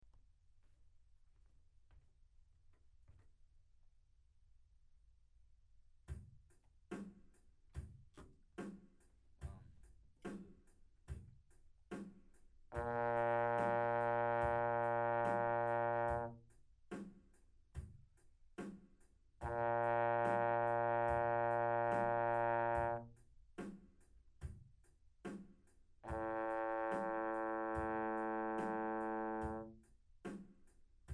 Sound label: long tone